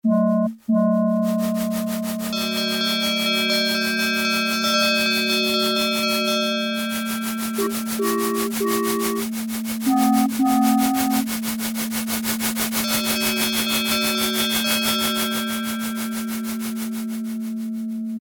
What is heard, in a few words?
TRAIN, Yamaha DX7